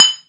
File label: GLAS, PERCUSSION; Drum Hits